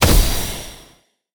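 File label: Pillar purple spawn, monster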